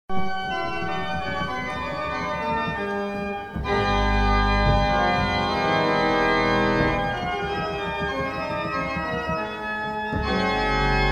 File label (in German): Orgel Improvisation